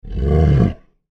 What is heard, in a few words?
sound ouch big; Monster Arena